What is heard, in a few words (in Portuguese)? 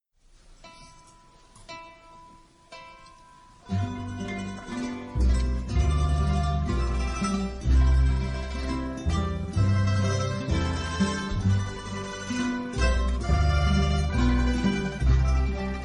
Marcha Live